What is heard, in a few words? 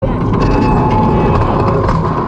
horn; Mystic Timbers; meloboom